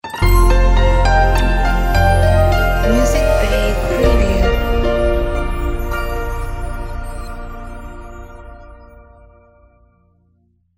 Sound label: Piano Logo